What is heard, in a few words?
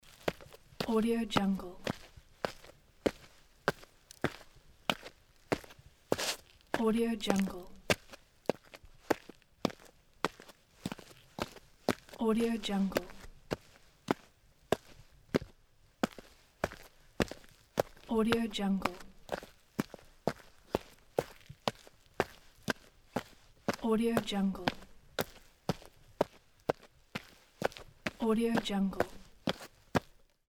foot steps on rock; savataivanov